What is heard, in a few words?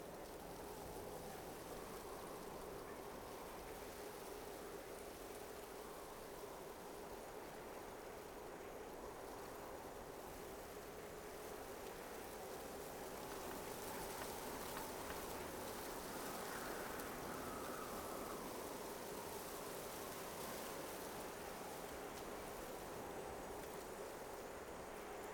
amb map; spirit blossom